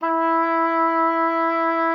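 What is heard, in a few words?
E4, oboe d'amore; Xsample VOL-7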